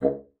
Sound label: bassoon, C#2; Xsample VOL-6